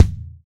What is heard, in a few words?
TY Kick; Roland BK-5